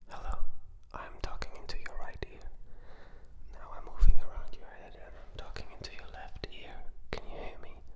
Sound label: whisper